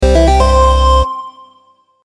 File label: power up